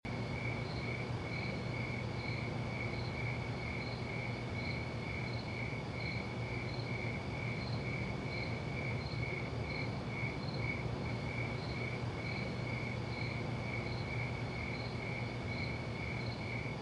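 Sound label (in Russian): городской парк ночью летом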